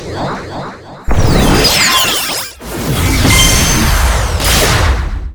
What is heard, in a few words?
Bonus get